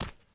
Role Walk

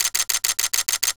Turntable

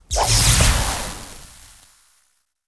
Mage Attack

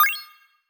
coin turn

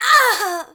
BOSS Woman Death

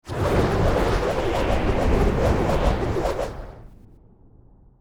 spear skill

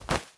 Item Pick

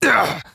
BOSS man hurt

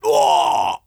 BOSS Man Death

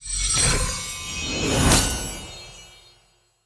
UI Open Map

